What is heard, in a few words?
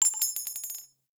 Sniper Shell Casing; DE; Warframe; Warframes; Frumentarius; ExaltedSniper